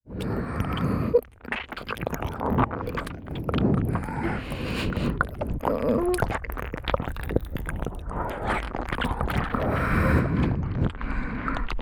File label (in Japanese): (両耳)耳奥舐めねっとりエッチ, KU100 パンパン系効果音版; 舐め音比べ